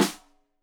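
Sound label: Snare Stereo, Drums; Deeply Sampled Drums; SMDrum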